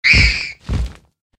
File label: wound; roha